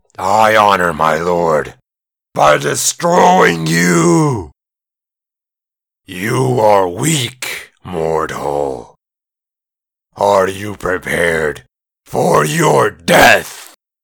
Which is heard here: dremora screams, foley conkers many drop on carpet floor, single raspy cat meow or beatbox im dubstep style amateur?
dremora screams